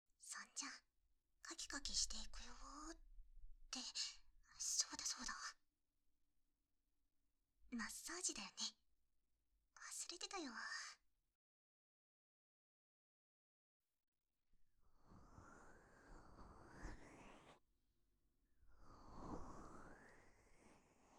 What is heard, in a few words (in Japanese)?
左耳かき, ASMR